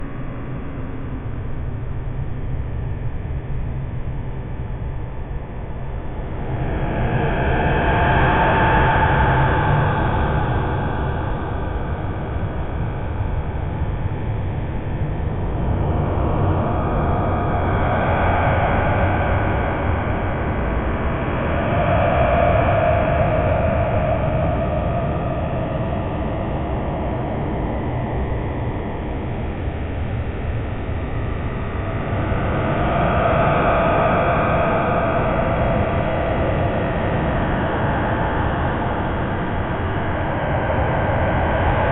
ambient a very scary sound, exploration

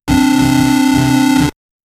lootbox opening effect, mobile

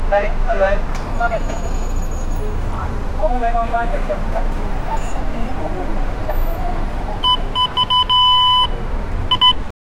busy new york city place, traffic

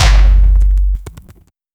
people ball kick

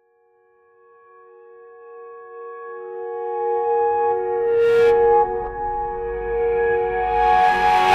distorted reflective sound, reversed piano

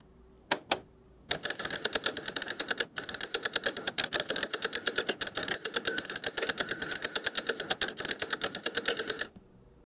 very very clicky and loud; counting

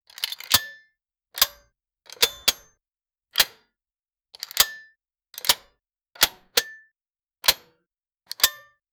style industrial field re, electronics

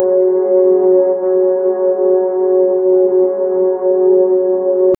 looping ambient tone, chill

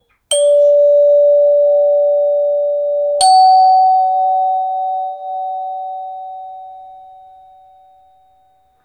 traditional mechanical doorbell

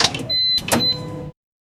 1980s DC elevator engine

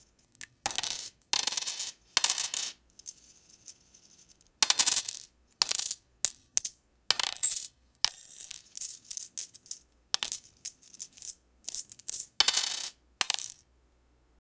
coins winning sound many

